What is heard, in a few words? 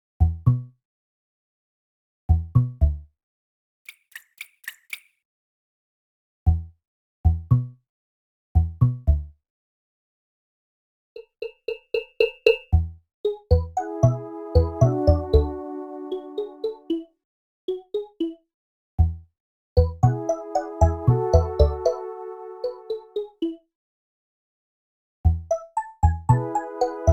Caves; Yoshi Touch & Go; Wii U